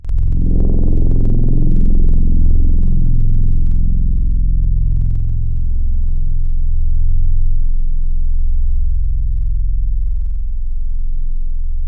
brassy, one shot; Wavetables; Synth One Shots; Synthetic's Serum Collection; ESW Core Wavetables; Echo Sound Works Core Tables

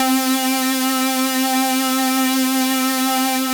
Fat Lead; HYPERBITS

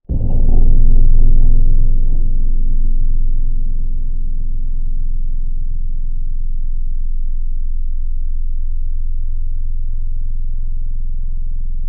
Gamelon; Synthetic's Serum Collection; Echo Sound Works Sphere

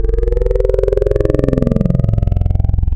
Vocal Digital Vowel; Wavetables; Synthetic's Serum Collection; ESW Core Wavetables; Echo Sound Works Core Tables

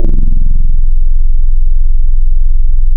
Key Bell; Synthetic's Serum Collection; Echo Sound Works Sphere

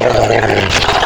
Dog, animals; Synthetic's Serum Collection; Cymatics - Animals for Serum - Gold Edition Wavetables

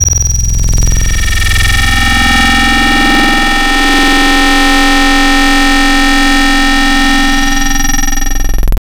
Growl Saturns Rings; Synthetic's Serum Collection; Echo Sound Works Core Tables

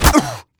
pain, player; Team Fortress 2

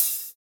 hat; AngelicVibes; Waves

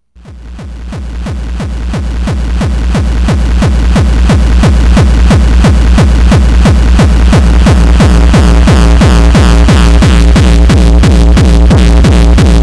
rain of drums, lolicore

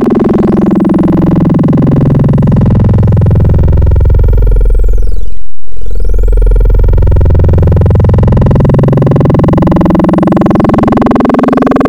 Fm Shifter II, Wavetables; Synthetic's Serum Collection; Cymatics - Nuclear for Xfer Serum Wavetables

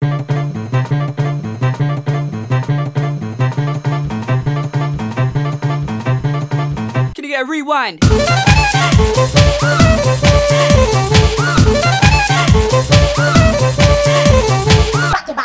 moonlight snare express, lolicore

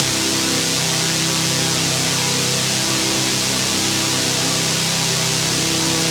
Shuffle, Noise Osc Samples for Serum; Cymatics; Shapeshift

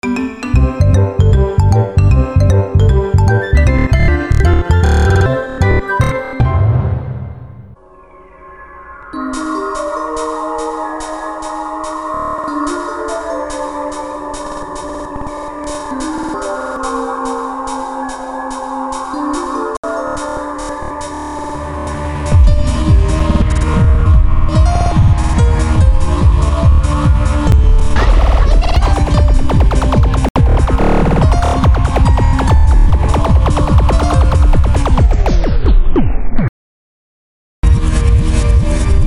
Breakcore

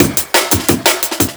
t175 eqd compressed badly, Processed breaks

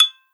kalimba; Synthetic's Serum Collection; Splice; Singular Sounds; Future Bass Noises

